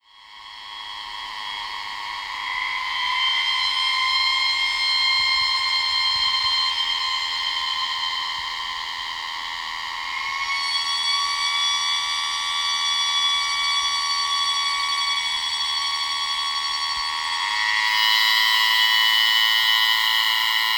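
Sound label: Champaka sp chorus, cicadas; PH; MN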